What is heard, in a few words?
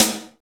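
snare attack; Roland L-CD701; Snares 3; Sn Modules 3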